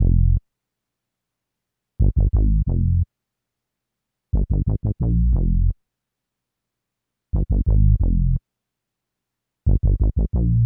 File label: HipHop Synthbass Fmin; Digidesign; Pro Tools Essential Loops